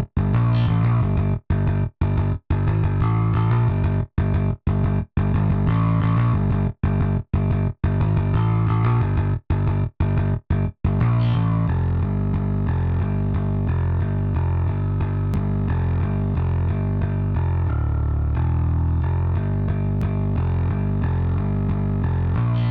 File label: Bass; Pro Tools Essential Demos; Digidesign